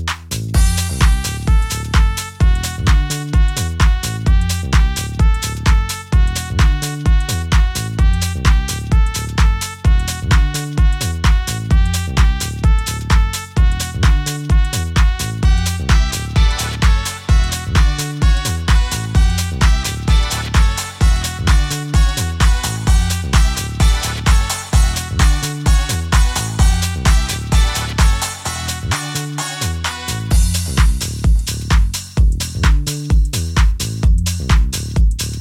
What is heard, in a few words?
Dub Instrumental